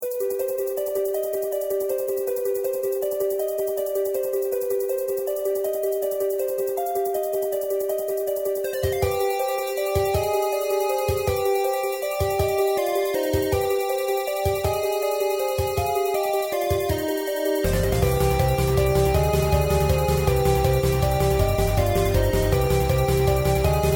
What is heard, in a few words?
roombeat